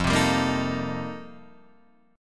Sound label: F 11th F, down, Guitar Drop D